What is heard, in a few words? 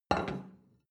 plate place table soft; soundpool